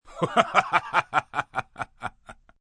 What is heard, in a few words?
Laugh Jolly, Laugh, male, jolly or evil chuckle (human voice); Laughter